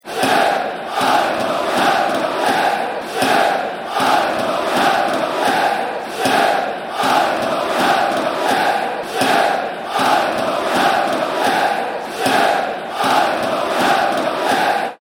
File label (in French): Allez paris allez paris